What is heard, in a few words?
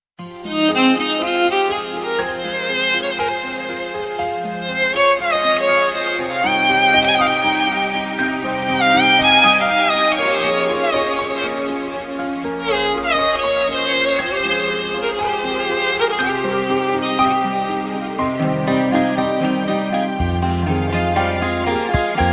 Mobile ringtone